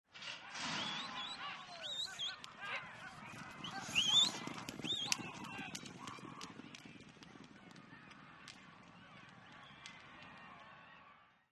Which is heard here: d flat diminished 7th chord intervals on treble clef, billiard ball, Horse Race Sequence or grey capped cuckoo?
Horse Race Sequence